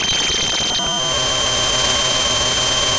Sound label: Sound of a cat meowing